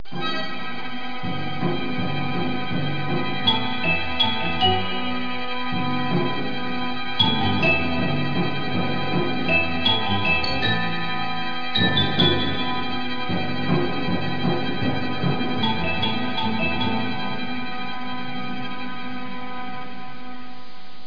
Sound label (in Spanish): SUSTO; El Juego De La Oca Loca